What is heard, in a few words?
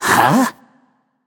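non-verbal confused; smite; voicepack; jingwei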